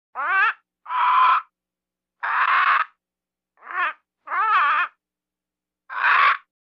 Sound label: Bird Parrot Various